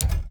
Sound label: mainmenu press inventory, ui